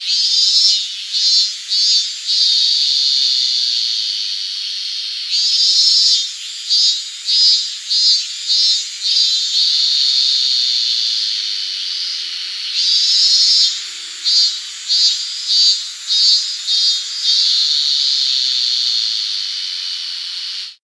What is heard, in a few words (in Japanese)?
koe minminsemi